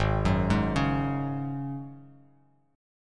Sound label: G sharp 7th Suspended 4th, Bass Standard; G#7sus4 arpeggiated; 4,9,6,6; JGuitar